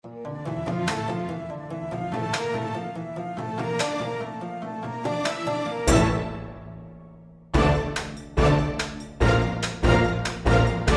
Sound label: boss music; rope ninja; Berklee Online Assignments